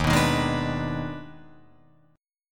Eb Major 7th Suspended 4th Sharp 5th Eb, Guitar, Open F; EbM7sus4#5; strummed